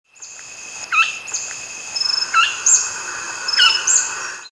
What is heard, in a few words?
ninox punctulata